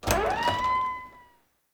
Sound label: Tailor Shop Door Open; Warframe; Ambience; Nineteen Ninety Nine